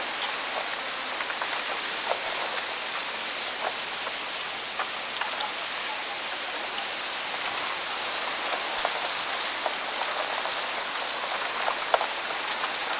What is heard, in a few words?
wind in palm trees; OpenValley